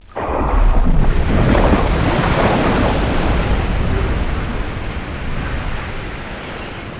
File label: Capsize; PC Gamer IT CD 44; Virtual Sailor